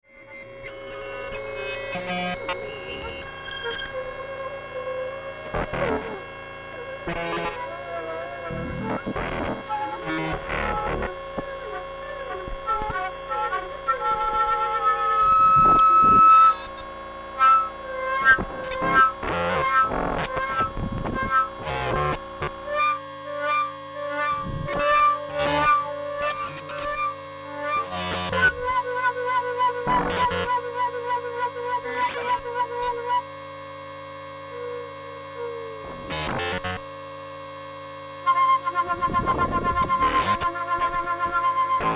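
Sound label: patch with flute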